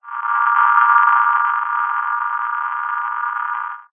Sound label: science fiction radio receiver transmission interference burst harsh feedback